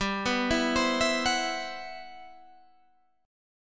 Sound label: C Major Sharp 11th G